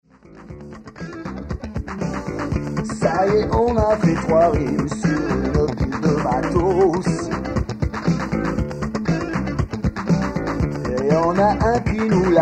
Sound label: Rock solo